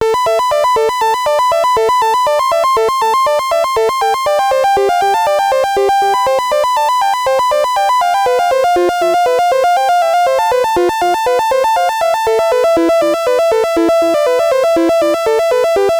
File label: Riff Smooth Overdriven Saw Variation